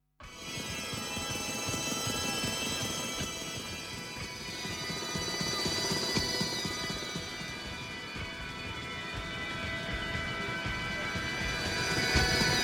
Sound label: expression drone